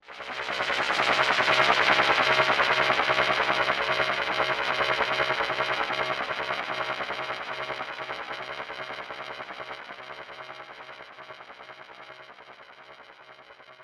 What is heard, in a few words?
Buzzzee; Sound FX